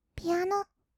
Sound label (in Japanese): ピアノ; ASMR; 色々なワンショット; 1200 kawaii voice!!